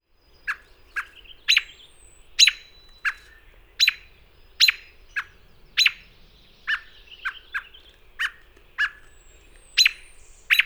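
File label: American Robin peek tut calls nest alarm; NY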